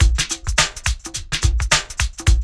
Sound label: LOOP, DRUM; E-Lab; X Static Goldmine 2; akai